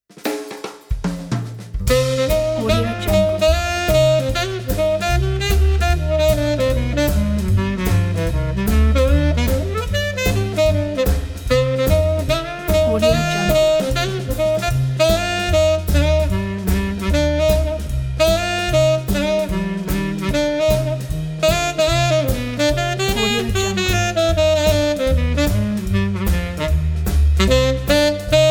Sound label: tenor sax swing